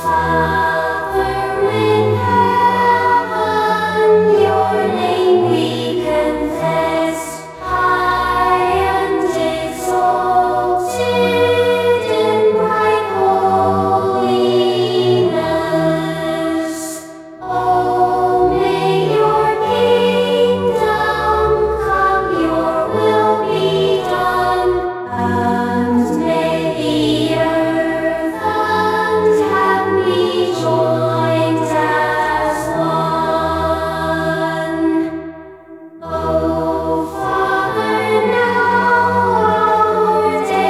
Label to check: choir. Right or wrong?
Right